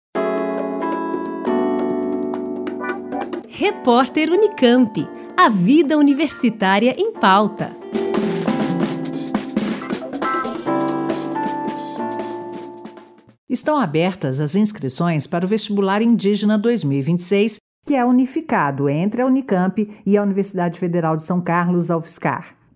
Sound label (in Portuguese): vinheta; VESTIBULAR INDIGENA 2026